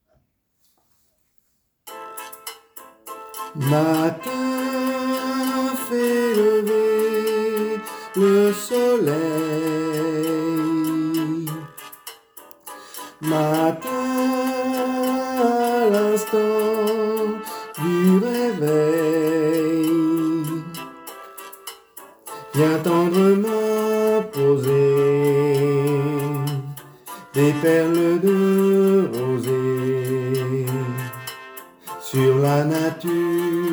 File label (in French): Voix Soprane